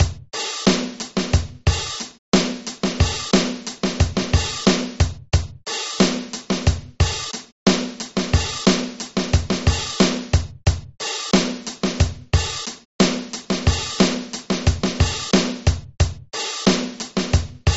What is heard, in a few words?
Main Groove, drums